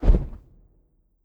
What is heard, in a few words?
Hawk Flap